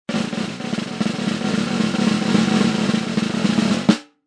Cartoon Drum Roll Short, Snare drumroll, anticipate; Comedy/Cartoon; Funny Sounds; Lynne Music